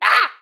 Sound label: Pecheneg Gray Damage